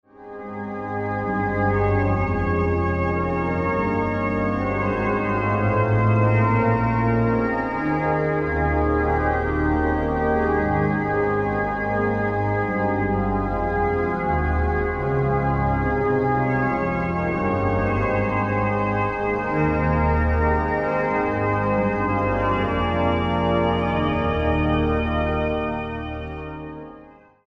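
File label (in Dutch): Psalm, orgel; Psalm 101, Bedezang Predikatie En 108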